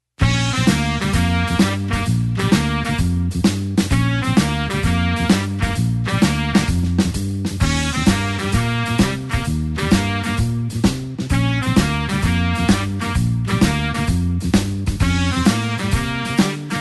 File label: blues horns shuffle Bb